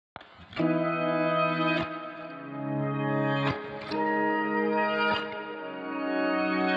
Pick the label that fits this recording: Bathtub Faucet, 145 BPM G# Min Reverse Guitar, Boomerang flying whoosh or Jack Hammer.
145 BPM G# Min Reverse Guitar